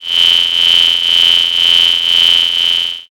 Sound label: cicada